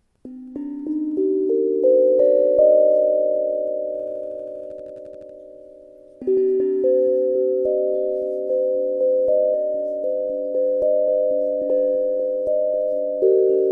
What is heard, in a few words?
Pigmi C4 432